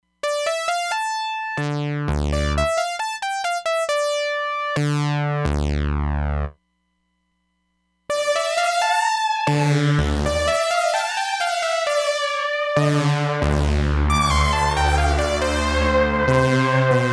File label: Modulated Pan Gate, Roland DEP-5; Factory Patches